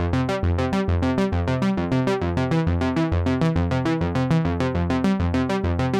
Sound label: Memorymoog, bass; OP-Z